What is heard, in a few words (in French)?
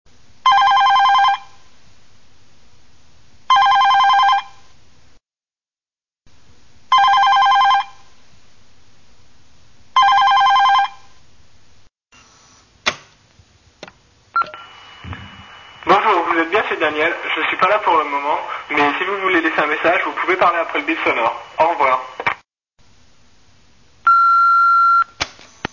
sonnerie message